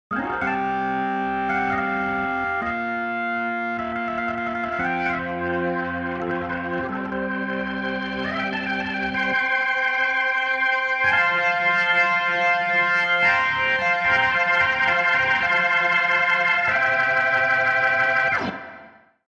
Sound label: vintage B3; vintage keys; logic pro